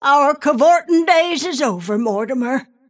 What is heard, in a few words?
death; snapfire